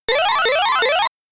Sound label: Creature Appears; Make Trax; Arcade